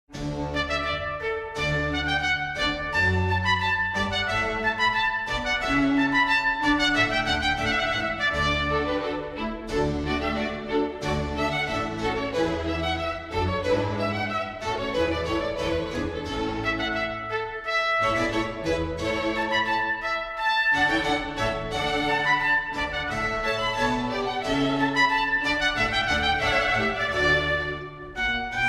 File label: Sonata For Trumpet, Strings & Continuo In D Major - Allegro